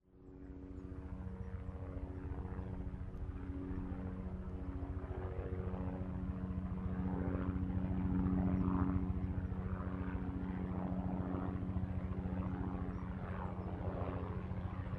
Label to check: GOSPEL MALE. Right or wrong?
Wrong